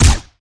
Lasrgun Guardian Pistol Fire

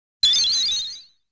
Beaker cracking sound; dondokodon

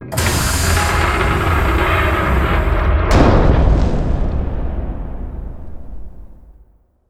gate lowering; area1

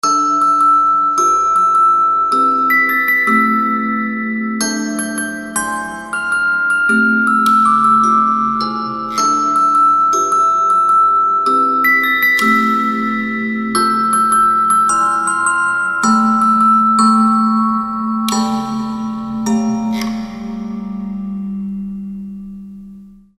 Orgel; tree of savior

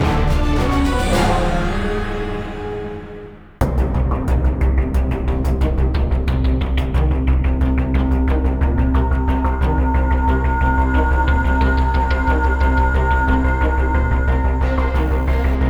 Lose Loading; modern combat domination; ps3

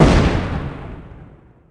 Sound Bomb

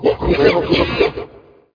boss chimps; boss mono

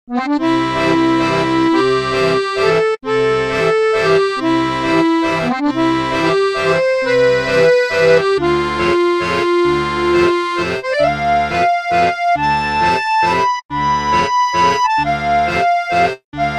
Belleville Accordion; Destroy All Humans Path of the Furon

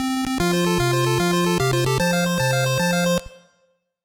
Match Start

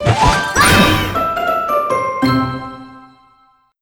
Crunchy Finish

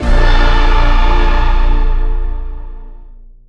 Dash Stinger; Quantum Entangle

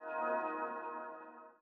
UI Menu Scorescreen unlock; A Year of Rain

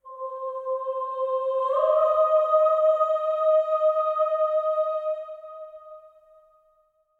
choir seq

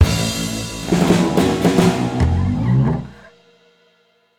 Music Stinger; Entrance Mood 2

